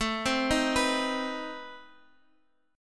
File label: C Minor Major 7th, A, guitar chord